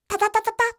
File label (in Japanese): tatatatata; dousa